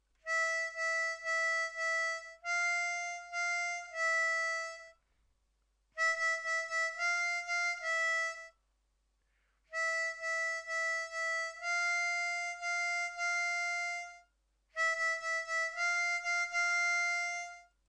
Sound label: Verse Fill